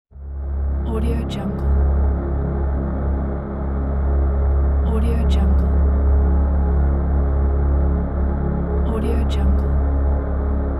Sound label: dark drone